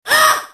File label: hurt; snes; smas; jupiter